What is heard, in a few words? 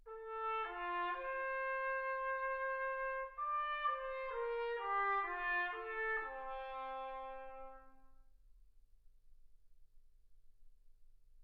trumpet